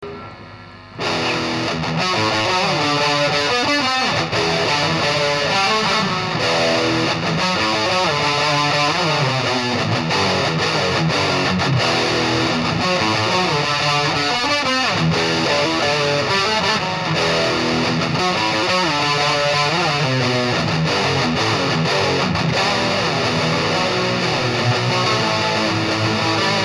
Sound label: Riff